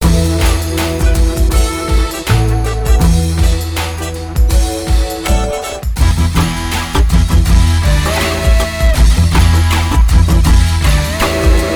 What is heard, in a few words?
Energy Hype Double Loop